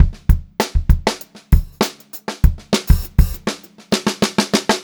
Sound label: FILL, Funk-Groove Drumloops; USB Soundscan vol.38; AKAI; 99 STRGHT